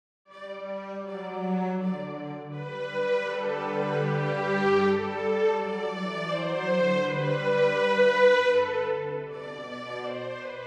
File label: minuet